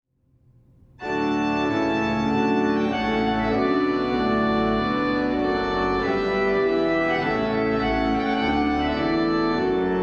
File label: Trumpet Tune; Stanley; wedding processions